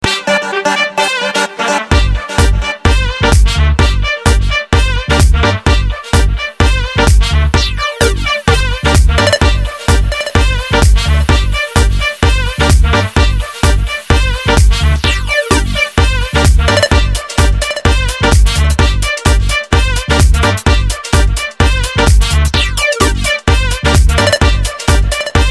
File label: Trompet; Got Talent